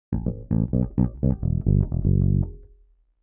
DEEPHOUSE BASS 125 A

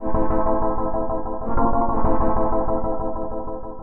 DEEPHOUSE ORGAN 125 A

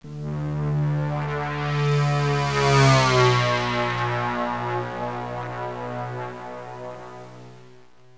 SIDSTATION FLYING BY; KillerTweaksII; DirectWave

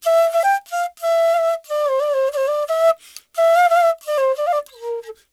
ETHNO EXTRA 90; ethno2

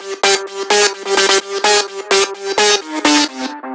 synth 128 Cm; Anthemic; synth loops

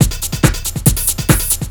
TECHNO BEATS 140

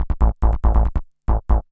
SNTH BASS ELCTR 140 A, Techno

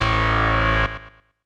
KEY SHOT TEKNO 140 A; Techno2; Singleshots

